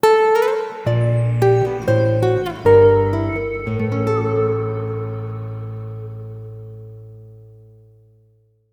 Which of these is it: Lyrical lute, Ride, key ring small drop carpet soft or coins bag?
Lyrical lute